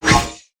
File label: Swing; Valiant; Sorcerer; aronwk collection; ndaudio